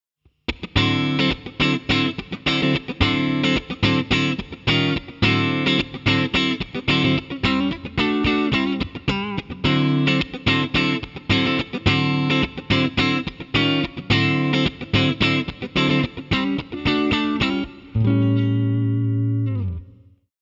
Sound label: V30 Funky